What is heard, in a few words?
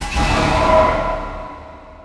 robo beat